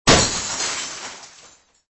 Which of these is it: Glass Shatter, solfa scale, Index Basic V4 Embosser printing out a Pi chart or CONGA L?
Glass Shatter